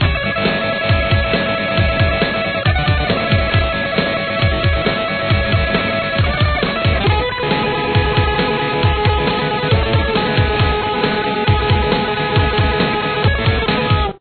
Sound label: solo guitar